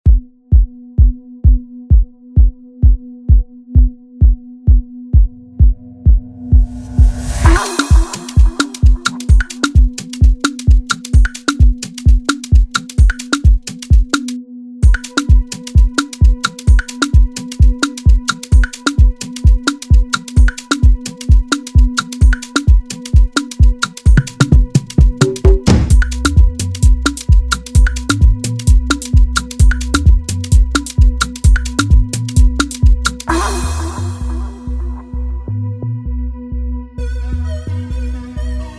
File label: Freebeat Zanku Rhythm